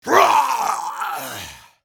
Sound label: death; Rengar; 傲之追猎者